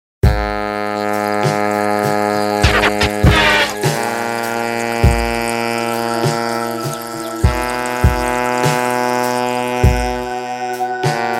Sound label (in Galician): SAXO NA NÉBOA